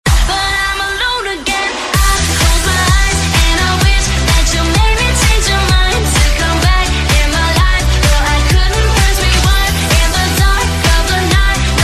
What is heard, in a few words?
English Song Ringtone